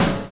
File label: Drag Snare; Sonic Foundry (Sony Creative Software); Drums & Drones; Construction Kits